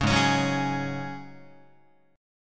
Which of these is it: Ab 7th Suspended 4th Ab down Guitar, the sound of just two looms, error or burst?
Ab 7th Suspended 4th Ab down Guitar